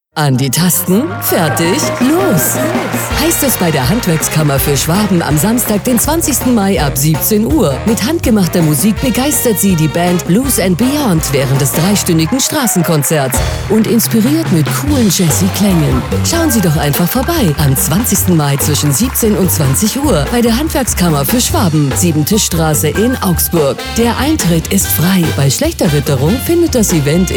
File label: Street Piano Event; KlassikRadio 2017